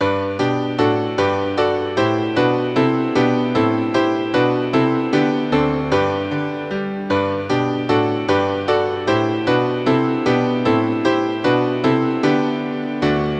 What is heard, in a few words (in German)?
kein schoener land ssa klavierpartitur